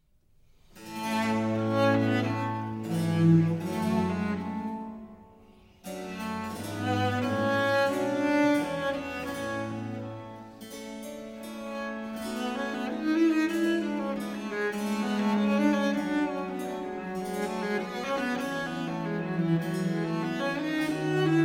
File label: Sonata For Cello and Continuo in B flat; Vivaldi; RV.47